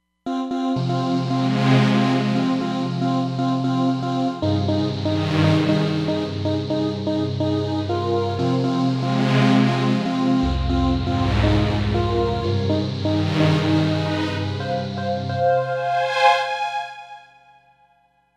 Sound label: Morpher, Roland Fantom XR